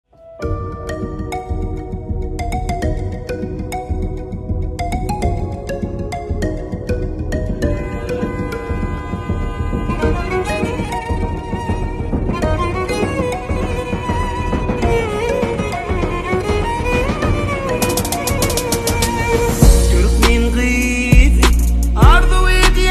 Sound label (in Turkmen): türkmen to y